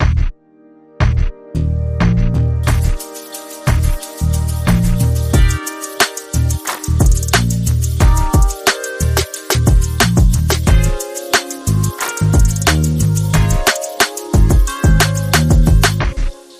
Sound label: Sassy Saxophone